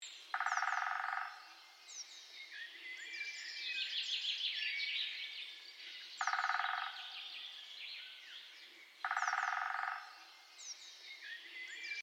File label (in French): Pic vert